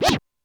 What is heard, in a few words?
Cat Scratch, Drum Machines Alesis D4